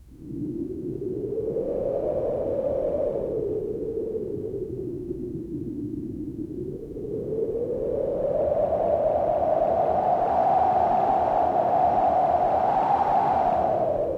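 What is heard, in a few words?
a microphone picks up wind; booming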